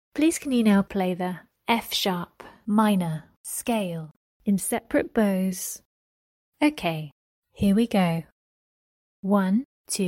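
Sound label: F sharp minor scale separate bows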